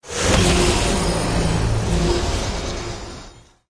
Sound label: lobby intro light; smite; arthur skin9